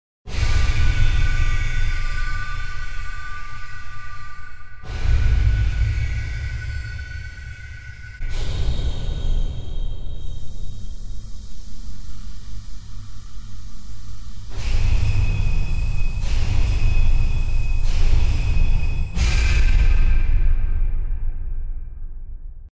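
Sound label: piano, virtueller Konzertflügel; melda; monastry grand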